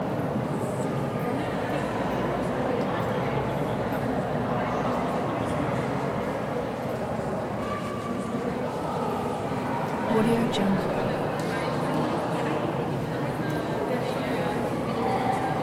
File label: ambiance mosque interior quiet, turkey istanbul